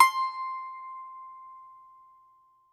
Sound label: BAGLAMA, Plucked Strings